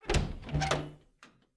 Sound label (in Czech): pokop; Mafie 1